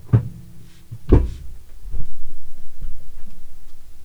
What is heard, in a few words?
open left emergency access door, A320 - 321 Aircraft